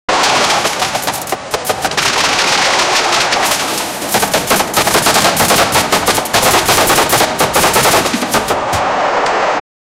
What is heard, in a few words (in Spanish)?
balacera urbana; ambush